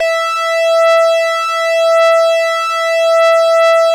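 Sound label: ARP lead E4; Sonic Reality; Vintage Timetraveler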